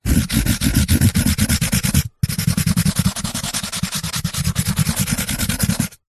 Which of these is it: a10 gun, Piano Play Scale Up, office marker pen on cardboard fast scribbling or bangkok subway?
office marker pen on cardboard fast scribbling